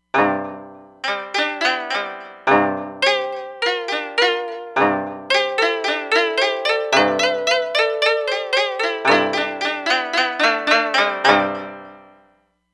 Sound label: Bend Koto, Roland Fantom XR